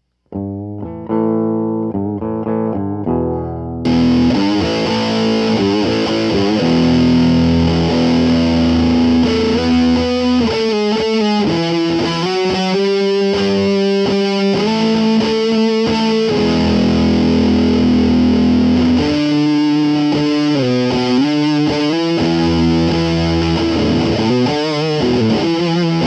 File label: High Gain Chords N Octaves; Zero Demo